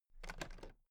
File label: toolbox latches open very soft